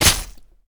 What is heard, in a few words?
slice